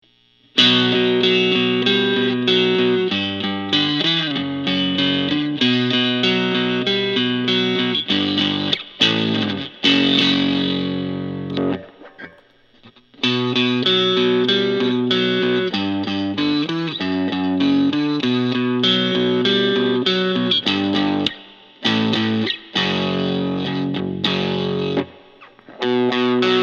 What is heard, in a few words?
Fender HB; black